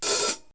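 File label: one cymbal, drums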